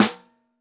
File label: SN Bottom, Snare